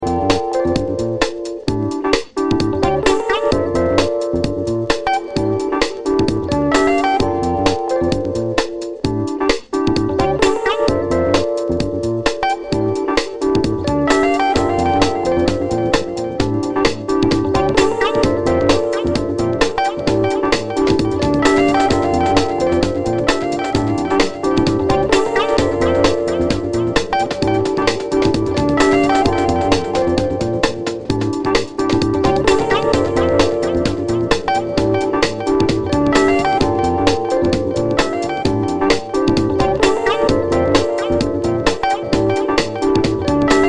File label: Jazz